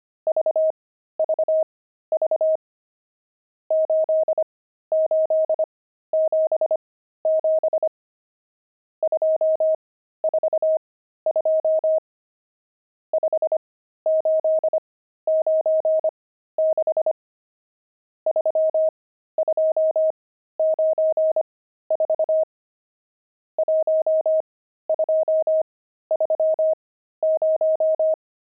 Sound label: pangram, intermediate pangrams files